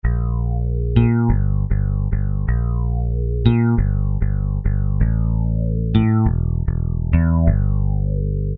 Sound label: synth bass, Kurzweil K2000; BASSES; Kurzweil K2000 V3; Extra Programs